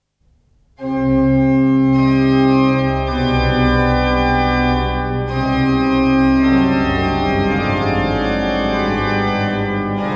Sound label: fantasie c moll, orgel; armbrust; op4 nr2